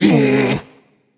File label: tongue; smite; persephone